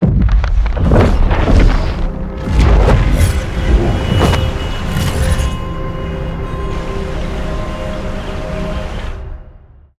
intro sound splash; eulcs madrid2018

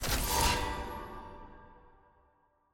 ui research champion

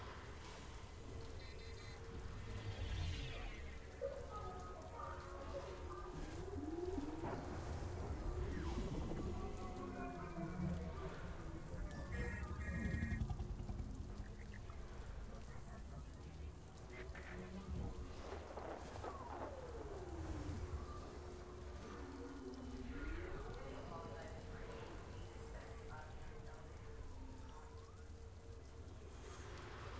ambience loop; tft set14

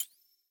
button upgrade click success; lobby strawberry

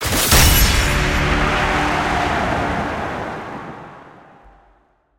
champ stinger bravery

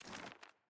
map panel open

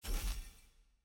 card hover; champ select; aram

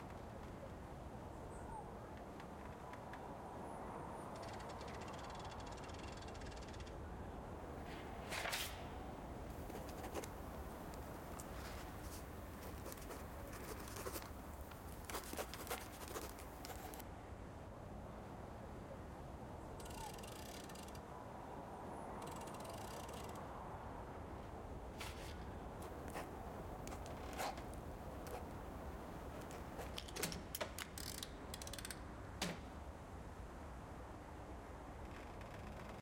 ambience loop; brawl

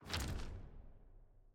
ui select

